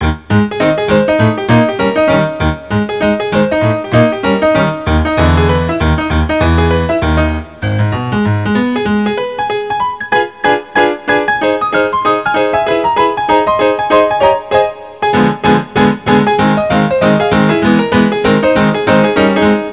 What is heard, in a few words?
RAGTIME